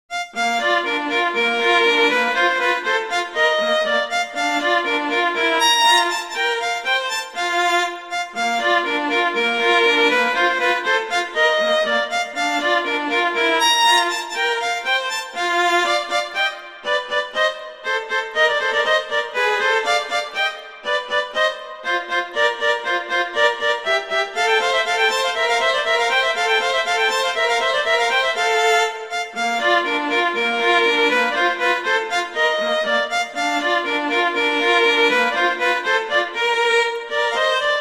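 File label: Duet, horn; Mozart; Duets; Vla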